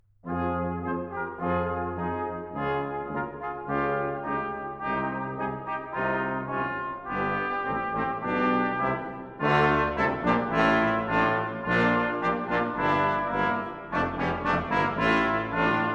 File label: Brass, Auf meinen lieben Gott